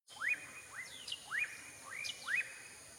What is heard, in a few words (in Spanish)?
pajarito